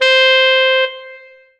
Korg TR Rack Solo Sax C5

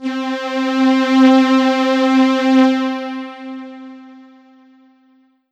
Roland D-20 Warm Pad Fade C3

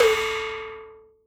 Synth Cowbell

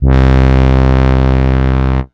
Ensoniq ESQ-1 Brass C3

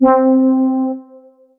Yamaha FS1r DX Soft C4

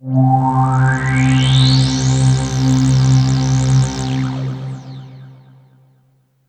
Kawai K5000W Goblins C2

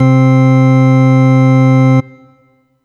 Yamaha EX5 Transistor C4

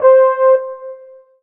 Korg TR Rack French Horn Ensemble C5

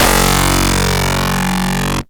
Yamaha TX81Z Fuzz Piano F Sharp3

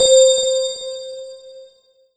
Korg TR Rack VS Bell Boy C5

